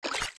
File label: Steps Shallow Water Jump; Zelda; OOT